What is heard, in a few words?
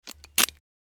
Key insert small padlock; locks keys